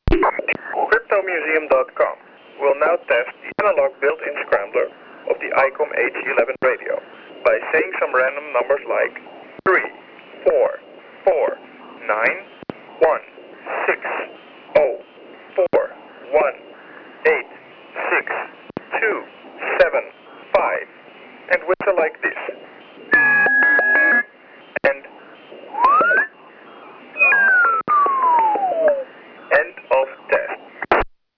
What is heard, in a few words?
descramble